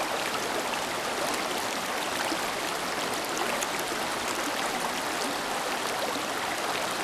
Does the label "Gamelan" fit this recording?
No